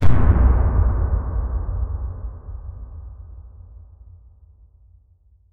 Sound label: a deep boom sound that reverberates with echo; sound effect